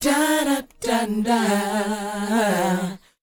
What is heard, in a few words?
DOWOP D#, Vocal R&B DOO WOP; ILIO; Vocal Planet